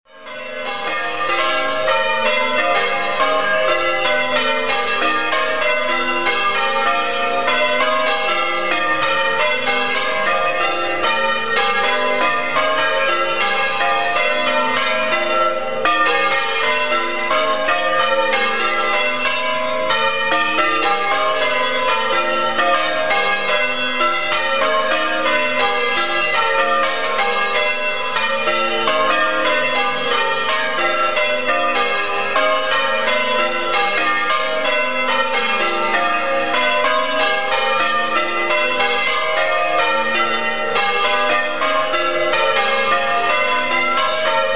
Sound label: bob minor; crantock; directory towers